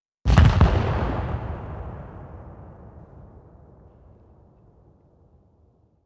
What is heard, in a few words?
expl close er, explosion; World of Tanks Blitz